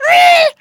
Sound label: piglet fear; Bad Piggies